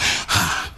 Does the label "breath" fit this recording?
Yes